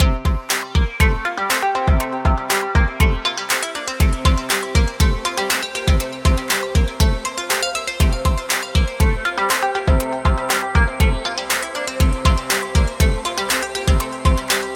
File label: samsung galaxy s25